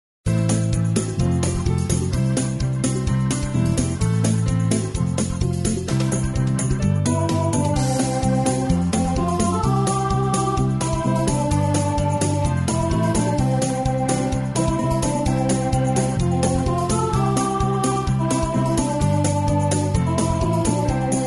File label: psalm 34 background vocal